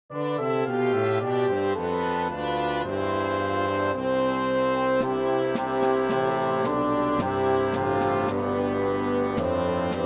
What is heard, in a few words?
Bassoon with Melody; Good King Wenceslas